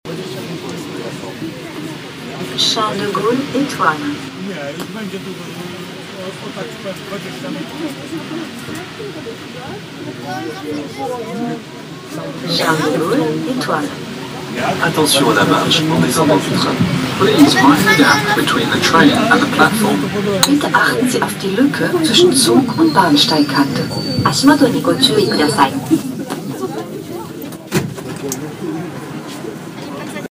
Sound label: Charles de Gaulle Etoile